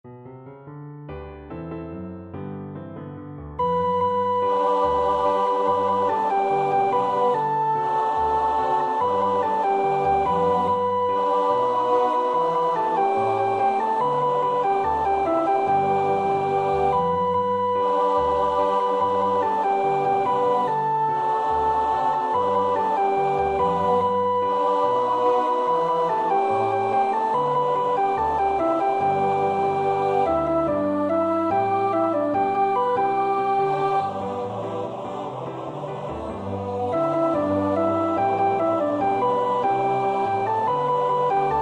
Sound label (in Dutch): Good news, sopraan